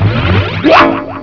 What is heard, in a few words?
ZING ECHO; EFFECTS